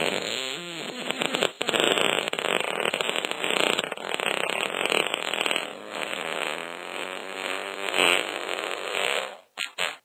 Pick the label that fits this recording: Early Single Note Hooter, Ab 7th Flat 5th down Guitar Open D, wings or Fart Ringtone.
Fart Ringtone